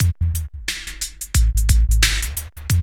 LOOP; E-Lab; X Static Goldmine 2; akai

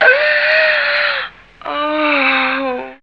SEX VOX

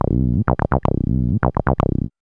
B LOOP; E-Lab; X Static Goldmine 1

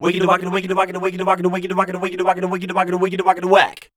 SCAT #F, Voice

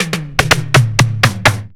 ROLLS; E-Lab; X Static Goldmine 2

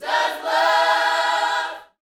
Vocal Gospel PHRASE

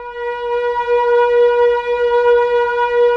ATMO PADS; Best Service; XX Large

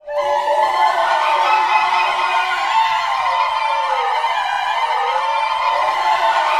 JUNGLE, Vocal, Gospel; Spectrasonics; Vocal Planet Vol.1

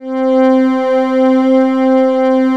Atmo Pads; Best Service; XX Large

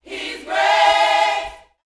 YOUTH CH PHRASE, Vocal Planet Gospel

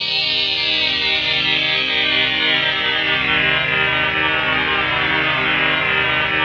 PHASE PAD, ATMO PADS; Best Service; XX Large

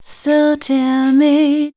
voice spectral, ly short

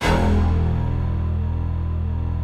CRUNCH

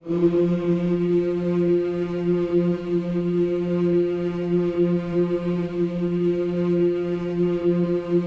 HMMS F 3, gospel; Spectrasonics; Vocal Planet Vol.1 Gospel; akai

